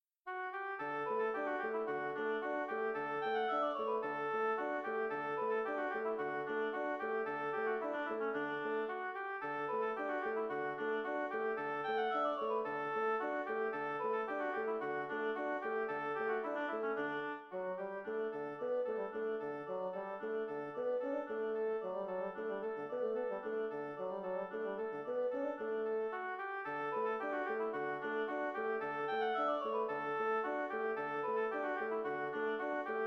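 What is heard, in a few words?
gavotte in D major oboe bassoon; dandrieu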